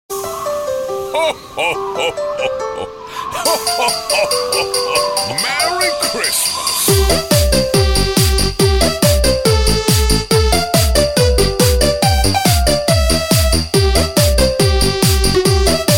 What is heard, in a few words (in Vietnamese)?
Nhạc chuông Noel remix